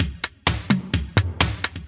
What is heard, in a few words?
Electro Loop 128 BPM; Vengeance Samples